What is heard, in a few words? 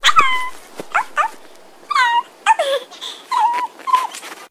arf arf